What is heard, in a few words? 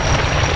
scrap; RedLine demo